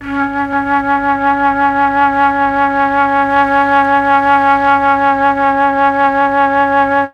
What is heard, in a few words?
flute; ILIO; Propeller Island Legendary M400